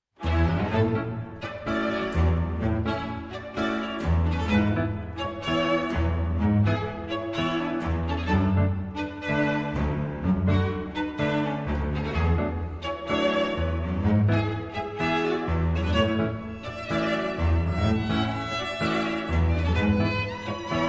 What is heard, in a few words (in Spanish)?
corazon de tango